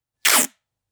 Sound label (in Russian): zvuk skotcha